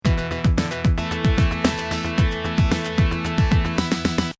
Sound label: Game Over; Genesis; Streets of Rage